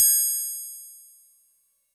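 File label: Fuzz Clav DX100 G#0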